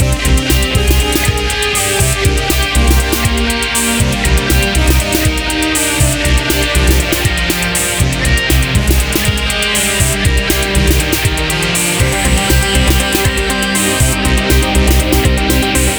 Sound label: Music